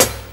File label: snare, traps; E-MU Producer Series Vol. 6 – Analogia Project; J.L.'s 109 Traps